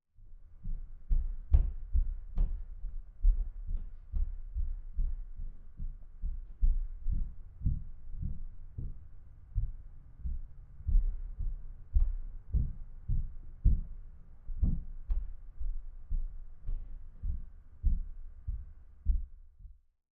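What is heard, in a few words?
Footsteps Female Walking Fr, stairs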